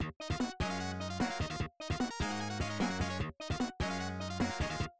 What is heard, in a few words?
speed up; talespin